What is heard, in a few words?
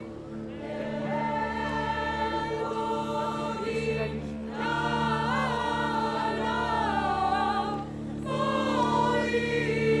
fanfare jazz festival; savoie